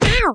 cupid hit; Cupids Match Up

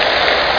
splsh; trakatak

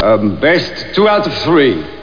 Sulk; worms; amiga

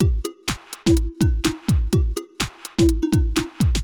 drum loop house 125; eJay

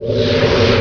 powerup pickup; vampire demo

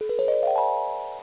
Magic; rescue elvis

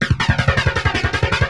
DRUMLOOP D+B 160, Drum+Bass Loop; eJay

